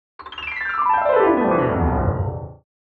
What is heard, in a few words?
Cartoon descend piano